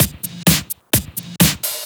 Straight Loop 128 BPM, House

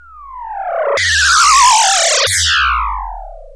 synth bomb; Zero-G Ambient 1; SYNTH BOMBS1

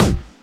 Percussions

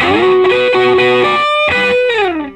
Vintage Blues Guitar, SOLO; USB Soundscan vol.22; AKAI; SOLO A125

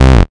Basses Root C; Synth Shots and Basses; Vengeance - Essential Clubsounds Vol.4; Note C

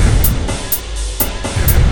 Processed Beat, Drums & Percussion; Electrocution; Processed Beats

